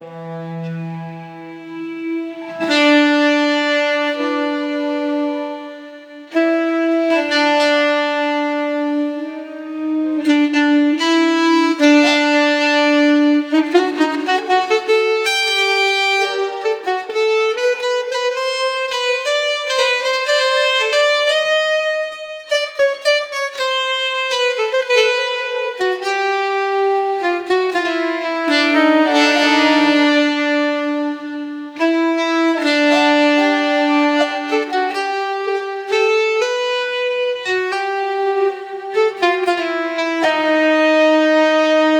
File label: minutes an instrumental